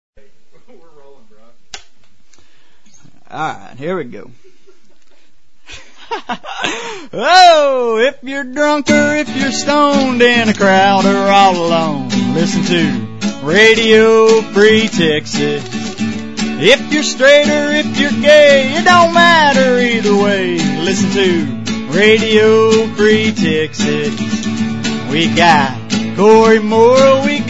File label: Jingle